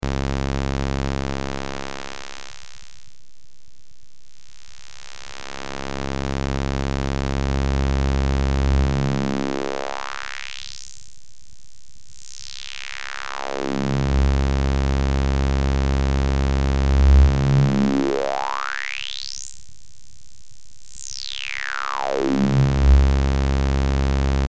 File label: hp saw, synth